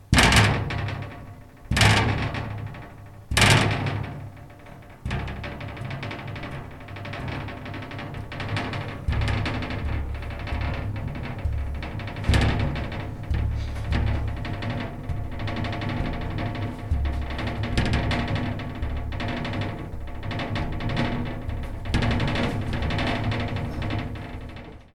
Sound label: glass hitt shaking from a display case, Old china display case being gently hit